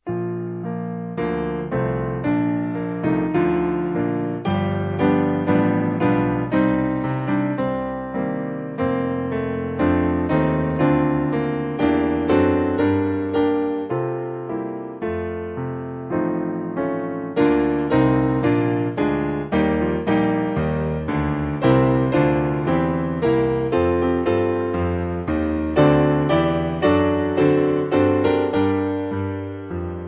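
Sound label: Piano, hymn backing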